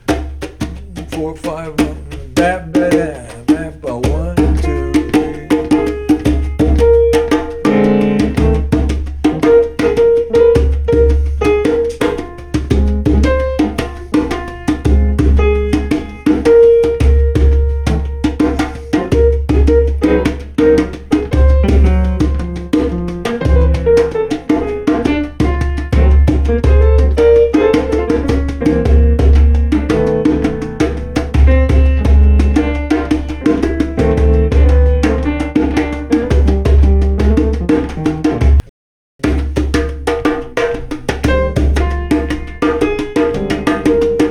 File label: blues in F; jazz; Mike Longo